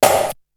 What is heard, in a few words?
Snare; ZERO G - Datafile DISC 3